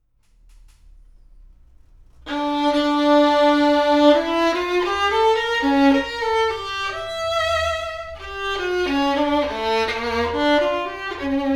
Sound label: Violin; Li Han Hsiang Workshop Violin Italian